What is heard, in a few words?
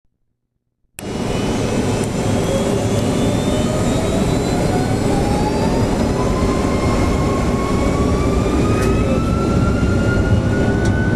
RB211 Interior, 757; synchrosoft